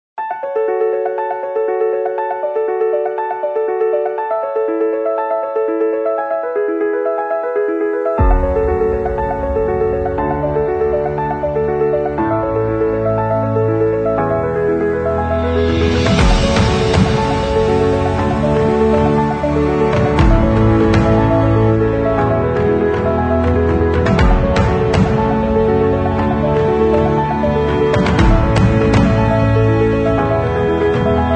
Epic, 是一部情感丰富、充满力量、充满活力、开朗乐观、充满希望、宏大而深思熟虑的电影预告片，配以管弦乐、电影震荡、电子强拍及低音。